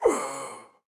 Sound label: wizard groan